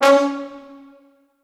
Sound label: C#3 R, Orchestral Brass